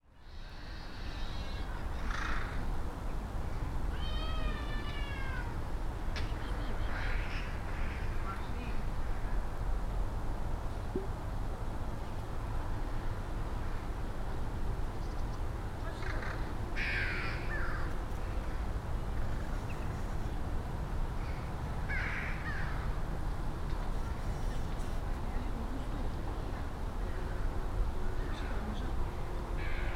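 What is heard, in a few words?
view over nightingale lake; ptitsegrad; solovyinoye lake; sergiyev posad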